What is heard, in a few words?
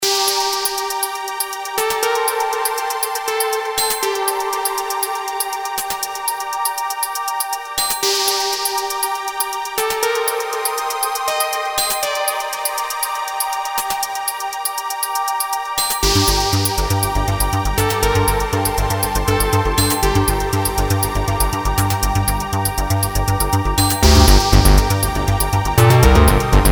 square bassline